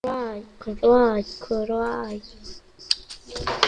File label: cry